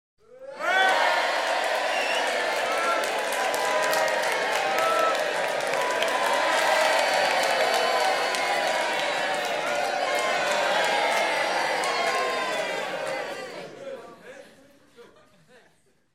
Light Entertainment Audience - Continuous Cheer And Applause; Rec. At Bbc Paris Theatre